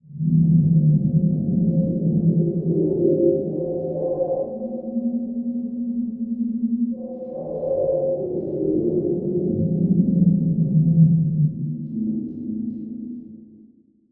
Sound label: Title Transition Stab Dark Demonic; Production Element